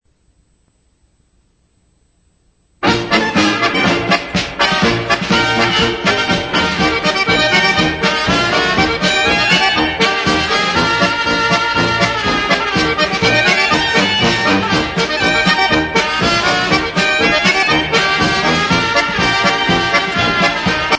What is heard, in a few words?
AZEE AZEE POLKA